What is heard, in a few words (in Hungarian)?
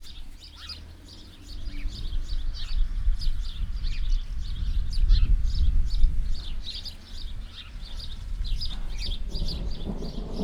egy kicsit hangosabb, szel, mennydorges